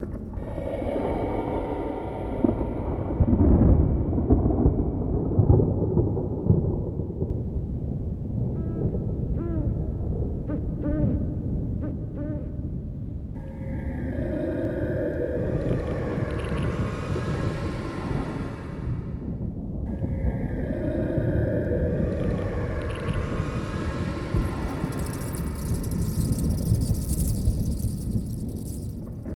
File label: Amb Eerie Graveyard Loop; Lucius III